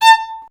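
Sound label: STR violin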